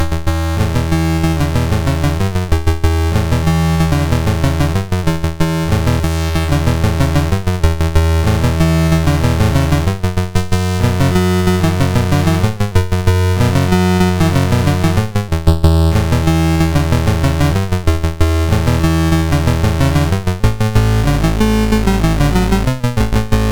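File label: Magicians Ball, MOS 6581 SID chip music, games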